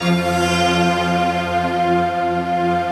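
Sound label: Chinese orchestra; Optical Media International; Sonic Images Library; Chino Combi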